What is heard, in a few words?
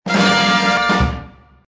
tada; pbskids; go studio